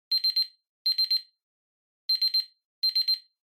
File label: Tabletop Timer Digital Alarm; タイマー機器